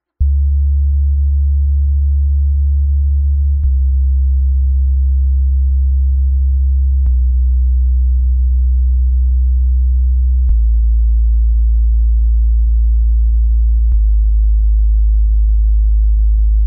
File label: bass test; sony